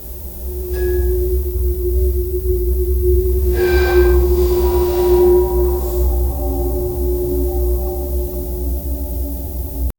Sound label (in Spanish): a lo lejos campanas, wind